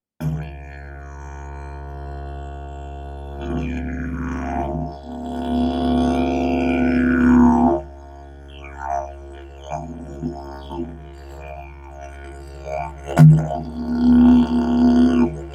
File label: didge